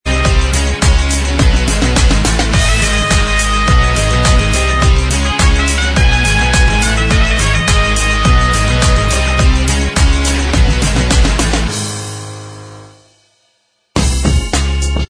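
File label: Instrumental Track, backing tracks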